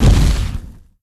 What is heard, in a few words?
burn